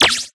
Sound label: spark light funnel